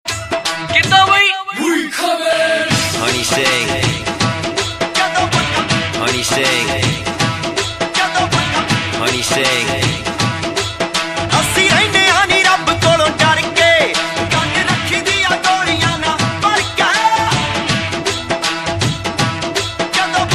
rap

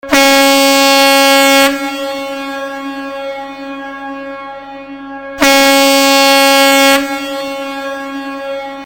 ship siren horn; ringtones; sms

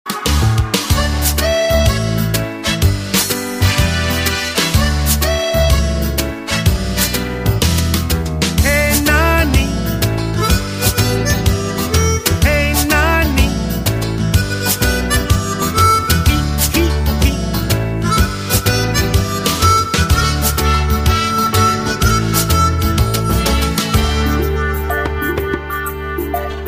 Synth Detective

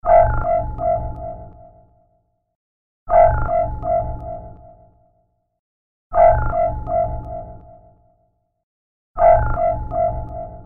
drone sos

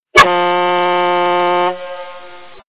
ships horn, sms; ringtones

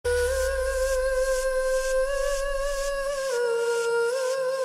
uuuuuuuuuh

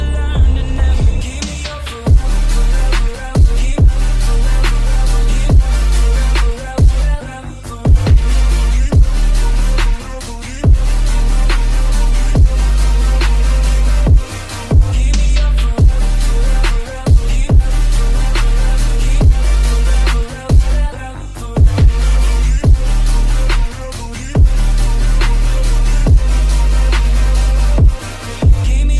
BASS

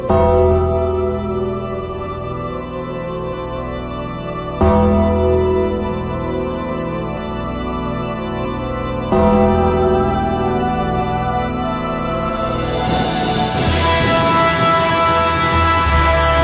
Instrumental

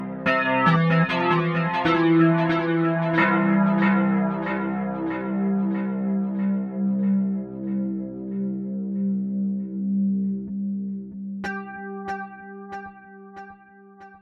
delayed guitar, ringtone

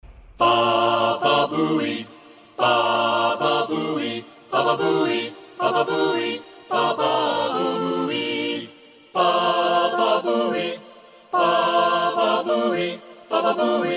Choir

